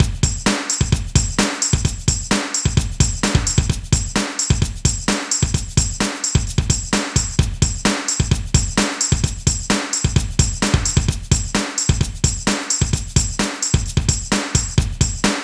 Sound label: ROCK, Funky Xtreams; INIS